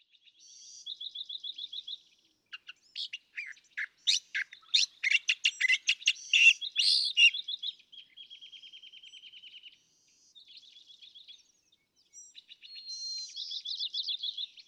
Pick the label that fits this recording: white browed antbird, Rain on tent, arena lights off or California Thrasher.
California Thrasher